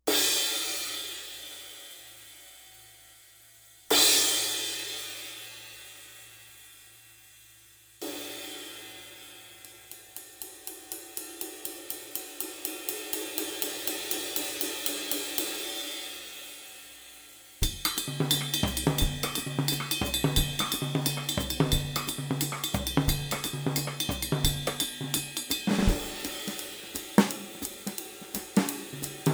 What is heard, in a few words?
20 Bosphorus Versa